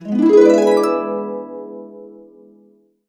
E, Harps; USB Soundscan vol.62; AKAI

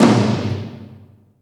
MID AMB TOM, ACOUST TOMS; Greytsounds

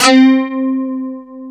DS8 C 3, Korg

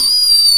FEEP, Anime Sound FX; COMIC

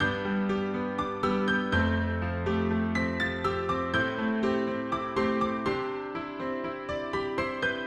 Cmaj 122BPM Tropical Grand; 99Sounds; Monosounds; Music Loops; PANDORA BOX!; Palm Vibes

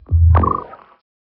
fx lowfidelity, Noise

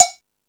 Percussion, Drum Machines E-MU Pro-Cussion

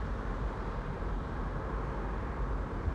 Ambience Loop, outdoor

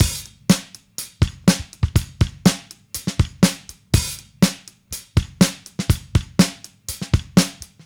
Drums, 70s Analog Funk; Sonic Foundry (Sony Creative Software); What it Is!; Super Brown

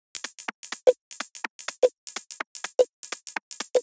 Top B 125bpm, House Drumloops; 90 s Sample CDs; Zero-G House Fabrik Drumloops; ZG Drumloops

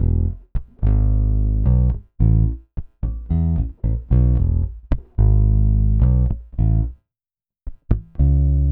Pop, Bass

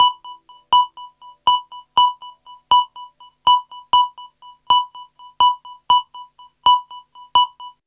SYNTH, TIMBA, Hip-Hop & R&B

